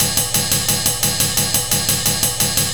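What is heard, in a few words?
ride, perc loops, 175, drum and bass; EZRollers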